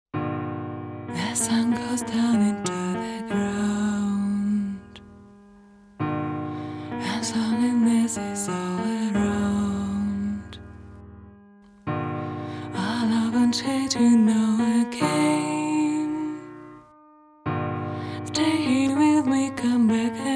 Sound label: Piano